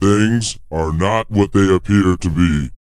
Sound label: PITCH LOW, Vocal, SCI-FI; 5 SCI-FI CHZ